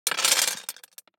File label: DICE on Glass, Throw and Roll, Standard, 16 Sixteen Dice; Samples; ArticulatedSounds; DICE Sfx Party (Extended)